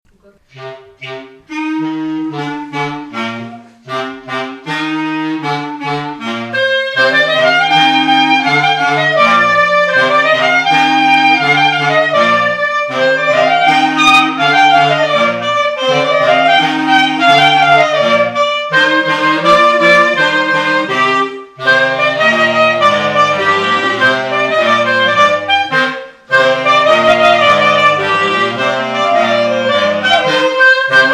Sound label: jeu andro, clarinette